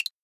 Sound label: Woodblock